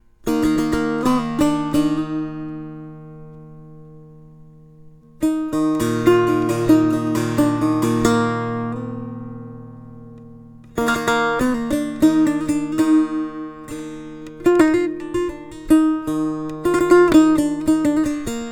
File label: setar